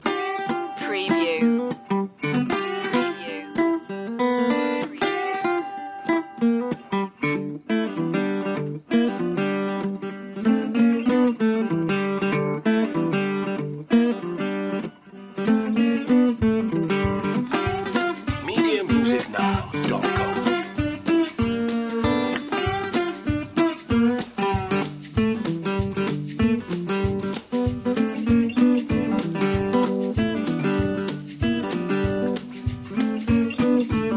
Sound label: rooster chasin blues